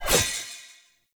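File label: Long Blade Projectile Rune Sword Throw; Powers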